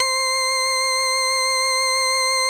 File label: C5, ORGANS; Music Store Sound Service; Einstein